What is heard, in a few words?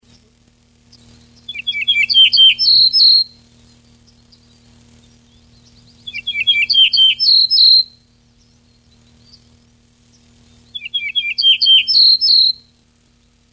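Emberiza aureola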